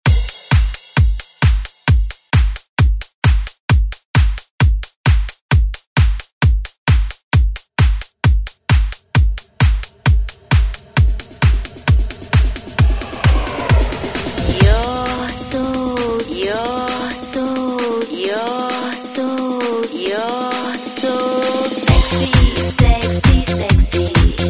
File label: Hit Dance Redrum; Deejay Hit